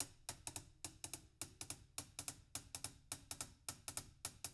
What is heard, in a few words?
HIHAT LOOPS, Living Drums; Sampleheads; Peter Erskine